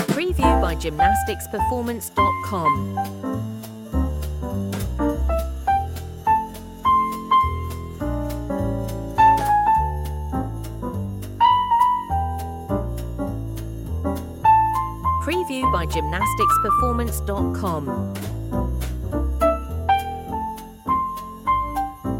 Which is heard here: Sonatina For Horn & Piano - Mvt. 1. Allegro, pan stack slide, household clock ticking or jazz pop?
jazz pop